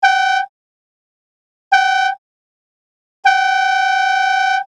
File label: air fanfare classic II, bosch, electrical